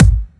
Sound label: drum hit normal